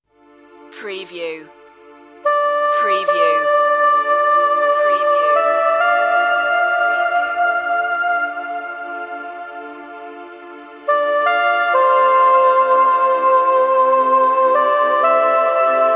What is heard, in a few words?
soprano sax air